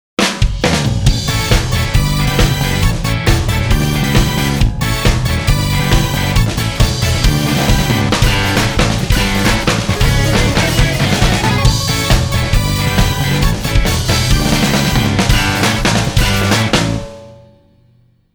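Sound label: Jingle Invincible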